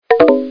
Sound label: type, ringtones